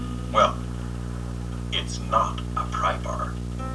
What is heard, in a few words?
prybar